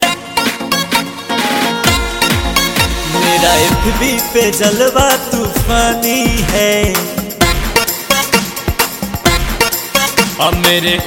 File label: Music